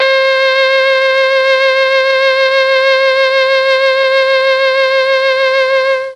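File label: C4 trombone trumpet, mellotron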